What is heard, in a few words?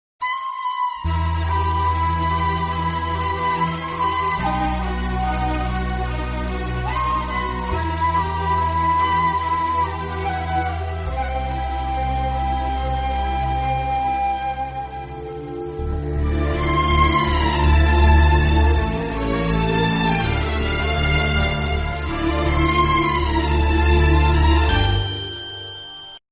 sad climax bgm; vijay; kavalan